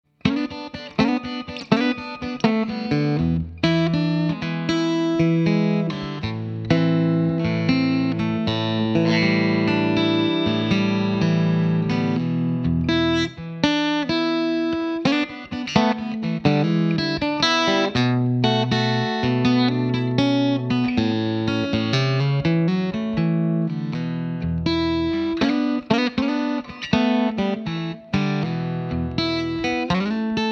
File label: Marshall Bluesbreaker Comp Verb